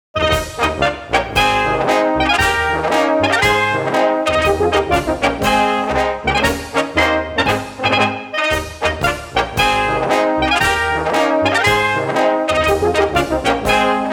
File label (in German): Pfatterer Marsch